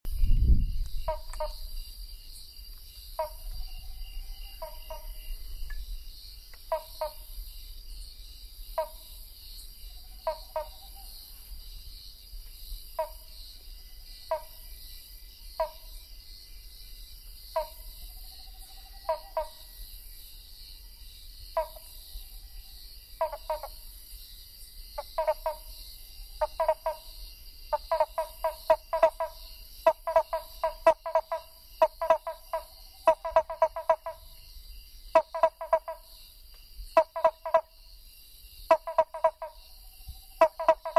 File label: Eupemphix nattereri